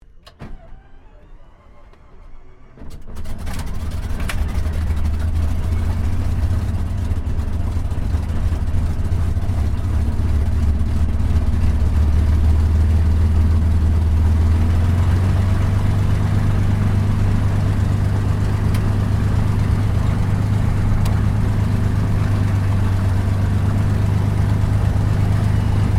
AIRCRAFT CAC CA-18 MUSTANG MK23 STARTUP IDLE INTERIOR; CA-18 /P-51 Mustang; Airplanes (Sound Effects:Vehicles)